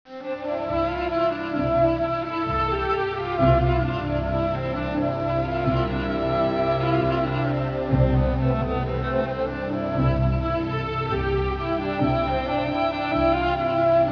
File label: drone